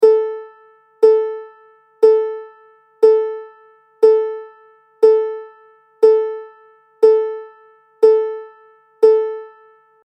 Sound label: ADFB A High